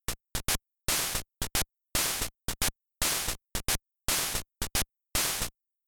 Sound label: noise; Duck Hunt